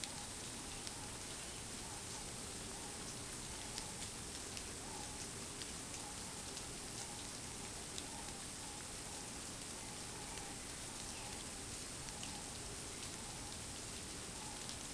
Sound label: amb swamp Rain; Star Wars Obi Wan; XBOX